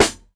present acoustic snare sound g key; snare drum samples

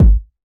studio kick one shot g sharp key; kick drum samples; one shots

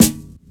steel snare drum sound a key; snare-drum-samples

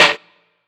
large room snare drum D# key; Duration 0:01; snare drum samples

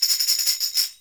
tambourine studio short loop; percussion

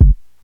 classic hip hop kick single shot g key; kick drum samples

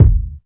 dope kick drum f key; kick drum samples

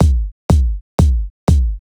House Techno Straight Kick 122bpm

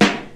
smooth bottom end snare drum f sharp key

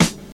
crispy acoustic snare e key; snare drum samples

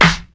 hip hop snare drum sample E key

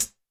low treble pedal hi hat single hit g sharp key

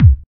dope kick drum sample d key

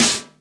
original acoustic snare a sharp key; snare drum samples

